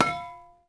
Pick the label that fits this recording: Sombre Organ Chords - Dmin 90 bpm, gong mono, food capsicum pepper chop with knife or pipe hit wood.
pipe hit wood